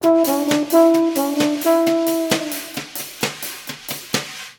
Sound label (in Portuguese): logotipo sonoro institucional; metrorio